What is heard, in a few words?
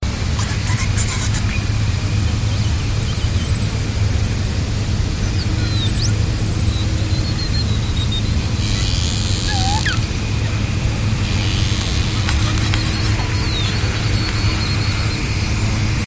beluga vocalizations; dam-migration